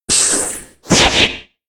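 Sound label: monster attack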